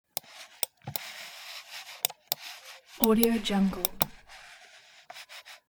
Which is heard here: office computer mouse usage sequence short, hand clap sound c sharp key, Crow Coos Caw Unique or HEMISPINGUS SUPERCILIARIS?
office computer mouse usage sequence short